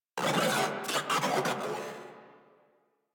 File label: Moa Vocal Attack; Warframe